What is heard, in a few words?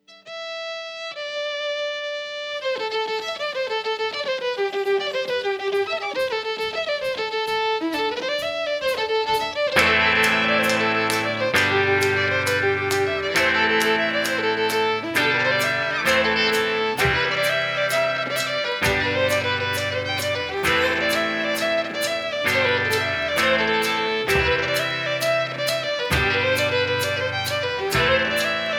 march, short dance